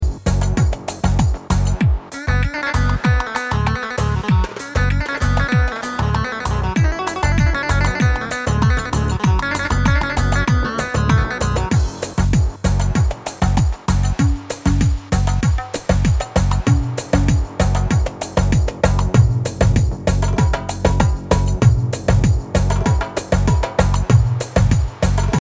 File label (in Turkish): ankara intro, pa3x